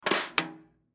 Drum Snare; Snare 14 Inch Pacific Throw Snare On Light Rattle; Drum Kits; Blastwave

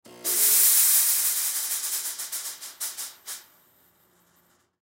Smoke Machine On Run Off Theater Stage Concert; Miscellaneous Electronic; Blastwave

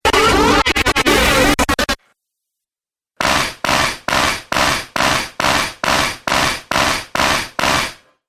Dubstep Scifi Effects

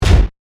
Hit Crunch; Production Element; Sound Design; BlastWave

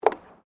FOOTSTEPS WOMENS HIGH HEELS BOARDWALK STEP; Footstep Foley; Human

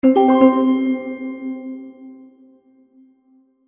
Magic Purchase Ding; Cartoon Sound Design

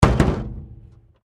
WRECK METAL PART IMPACT METAL HEAVY, Wrecked Cars; Wrecked Cars, impacts, crashes, scrapes; Objects

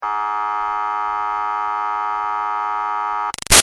Siren Police Buzzer Long; Emergency Vehicle Sound Effects; Big Room Sound

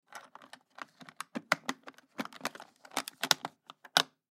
Makeup Kit Professional Close; Primping; Foley

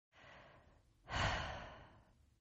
Sigh Female; Exhaling; Voice; Partners In Rhyme

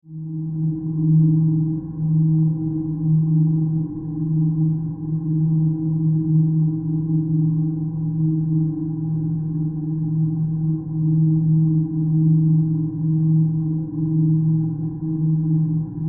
Science Fiction Drone Power Hum; Drones (Sound Effects:Sound Design)

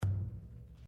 Wooden Column Impact Fist; Material components for constructing more complex sounds

